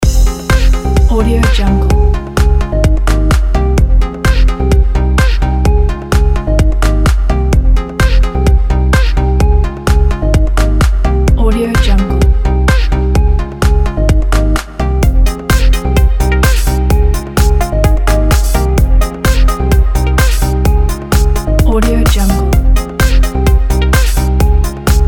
house dancer

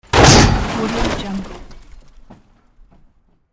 metal impact

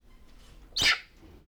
monkeys various single chirpy squeals

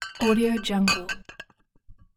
glass bottle grab

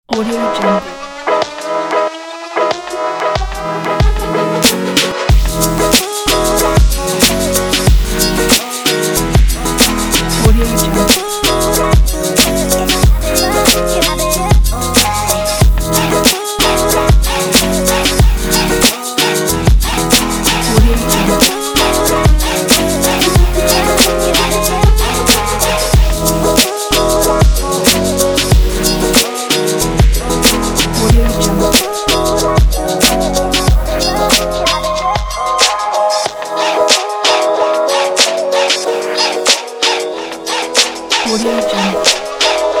jazzy hip hop

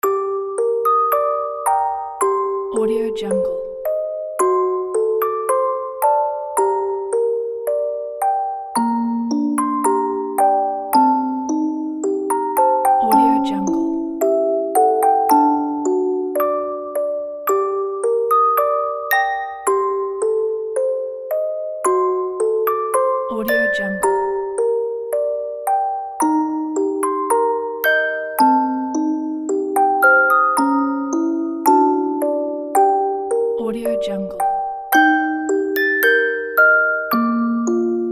music box

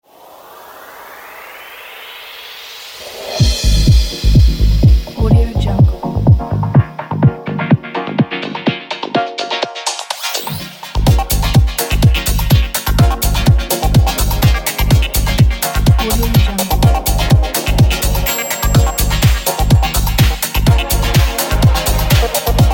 energetic intro

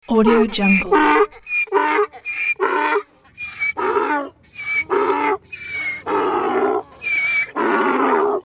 donkey braying with squeaks; sound ideas

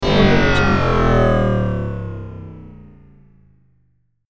futuristic power failure

